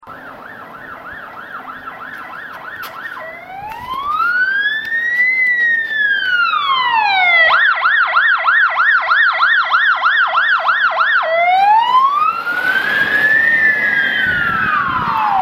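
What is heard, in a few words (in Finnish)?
ambulanssi, ambulance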